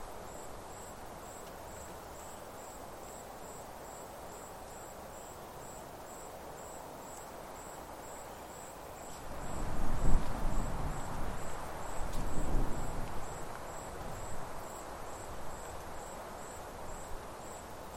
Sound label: wind